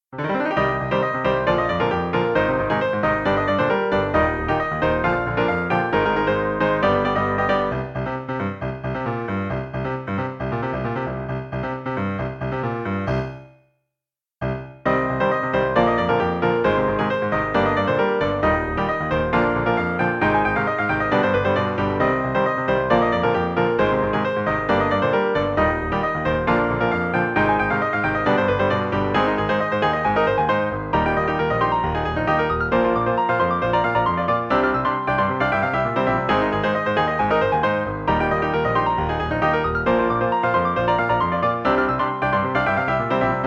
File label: Piano Duet Cover